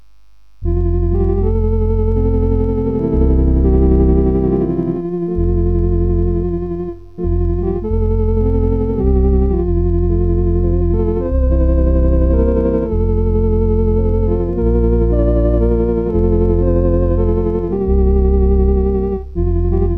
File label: When We Walk With The Lord, Organ